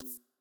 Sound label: Button Click; MiSide